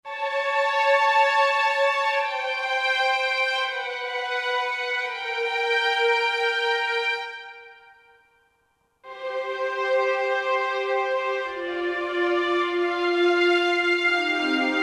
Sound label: Emotionale, Roland SR-JV80-16 Orchestral II